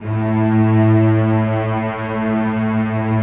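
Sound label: celli sustain a2; Sonatina Symphonic Orchestra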